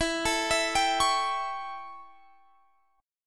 A 7th E, chord

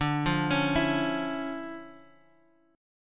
D Minor Major 9th D Guitar Open F; 14,12,13,11,x,x; DmM9 arpeggiated

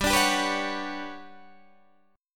G Major Sharp 11th G, Guitar Standard; strummed; capo=14

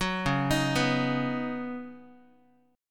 Db 7th Suspended 2nd Suspended 4th, Guitar; JGuitar

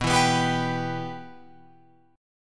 B Suspended 4th Sharp 5th B Guitar Open F; Bsus4#5 strummed; JGuitar

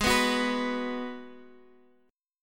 G sharp Minor 7th G sharp

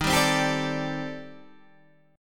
C Minor 6th Add 9th Eb

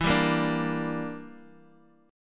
E Minor 7th Flat 5th E, Guitar Open D

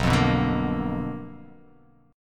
B Minor Major 7th Flat 5th, chord

